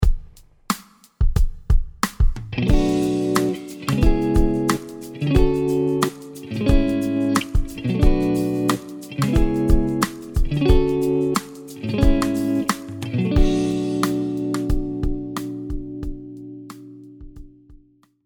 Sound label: B Dorian Tonal Center; guitars; key centers vs tonal centers